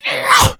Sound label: shoved, infected rage; npc, action